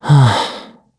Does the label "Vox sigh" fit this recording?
Yes